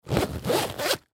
Camera Case Unzip Front Pocket, Digital Olympus Camedia; C720; Cameras; Technology; Blastwave